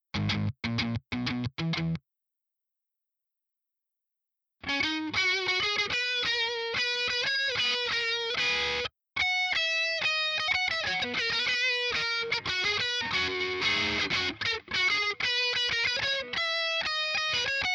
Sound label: GTR crunch